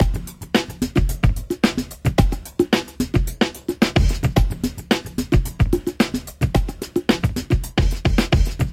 Beat

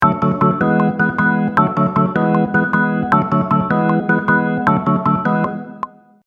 Organ

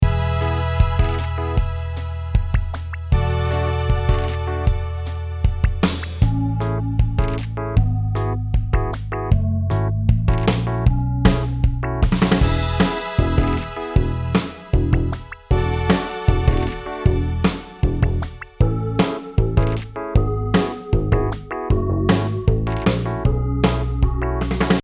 Synth

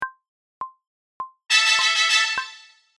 Trumpet

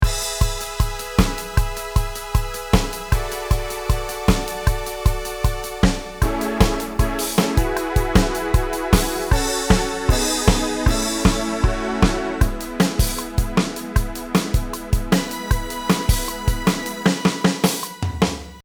Strings

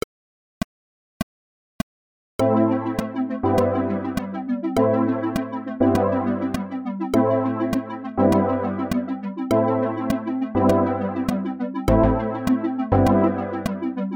pad

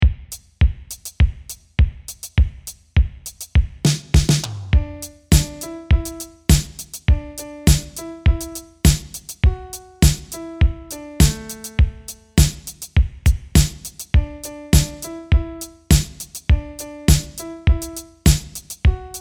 Drum

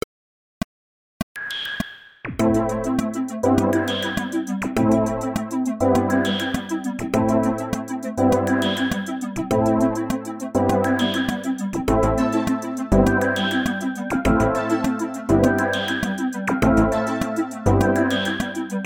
perc

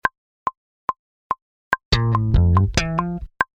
Chopper Bass

